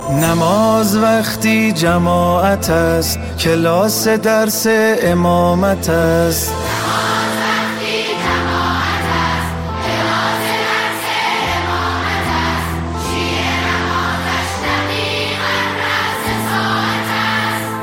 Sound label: prayer ringtone